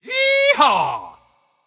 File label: gambler win